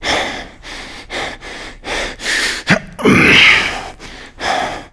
player climb efforts

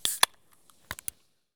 drinkcan open; Escape from Tarkov

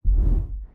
damage hits explosions, Warships

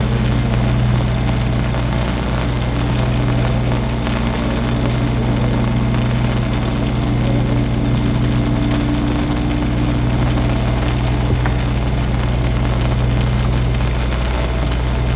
powerline hum loop

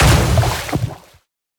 depthbomb; World Of Warships; weapons

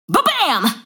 Hit Confirmation; World Of Warships

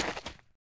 Horse Hoof; Civ4 warlords; Resouces and Improvements

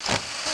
zoom in; World of Tanks Blitz; GUI zoom

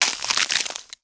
pick ice cracking stop; footsteps; Call of Duty Modern Warfare

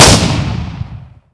m1014, shotgun; weapons; ModernWarfare

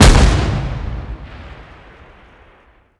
proxymine explode

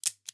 python dryfire; Rust

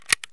ammo load; Escape from Tarkov; ambush

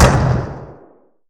gepard KDA End; Weapons; DCS

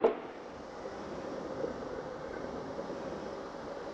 NR23 Arming On; Weapons; DCS

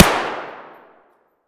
grach fire indoor distant; Escape from Tarkov

